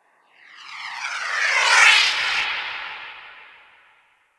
Portal Random B; Crp Exterior Gln Interior

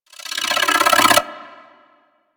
Head Spin; Enemies; Abilities; Arachnoid Wraith; Warframe; Corpus

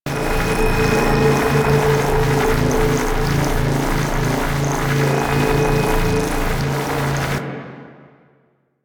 Shield Loop; Warframe; Nineteeen Ninety Nine; PvPvE

